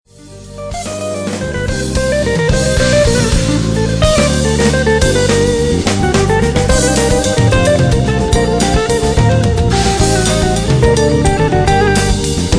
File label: Song for A Violin